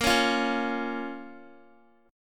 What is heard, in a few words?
A sharp Minor, down, Guitar; 4F,4A,5C,5G,5C,6E; strummed